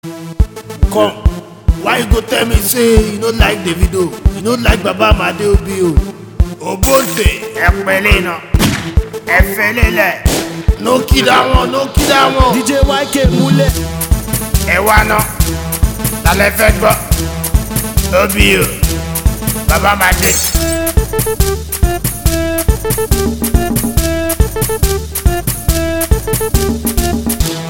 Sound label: Cruise Beat